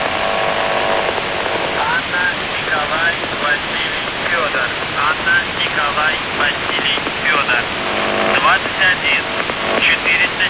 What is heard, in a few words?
buzzer usb msg